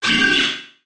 Hard Hollow Sweep

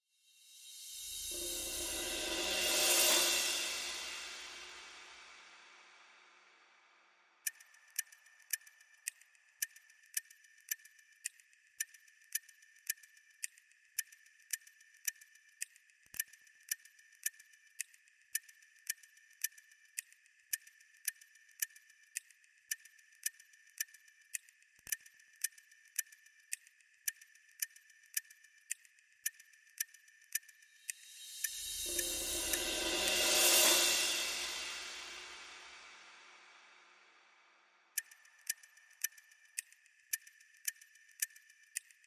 Tick Tocks and Cymbals Only

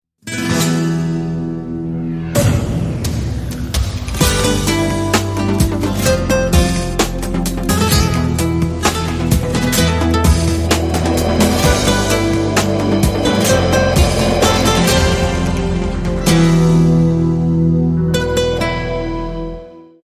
Flamenco Half Run

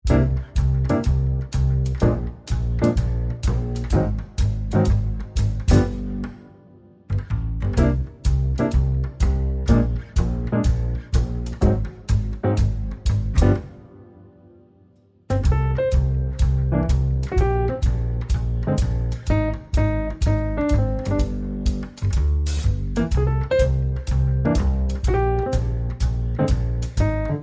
Jazzy Jams, Full

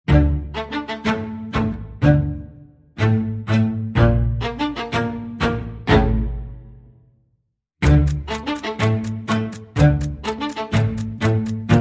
Strings Percussion and Effects

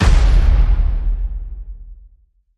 hit big; Sidewalk Cracker

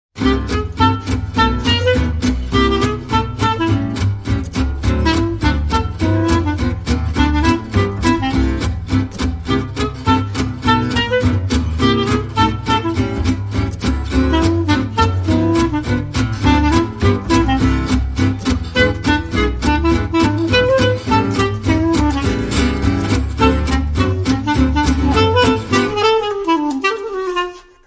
Clarinet Version, No Drums No Lead Guitar

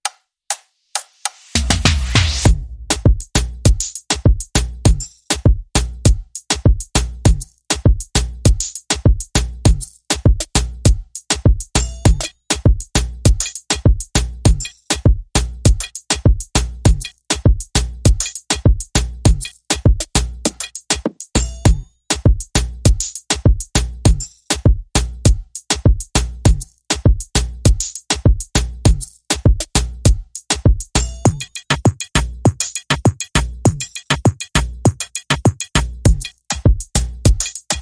Bouncing Club Beat

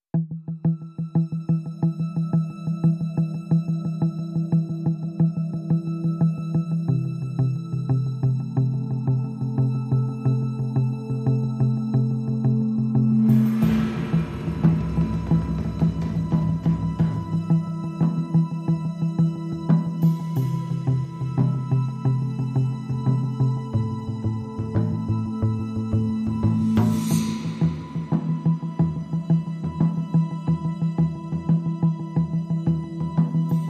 Rhythm and Bass Only

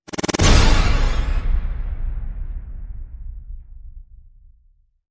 Digital Scare Slam

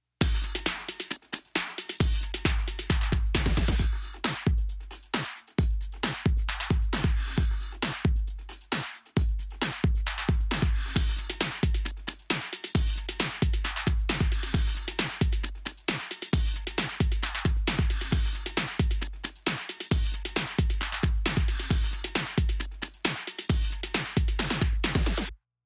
Bonus Beats

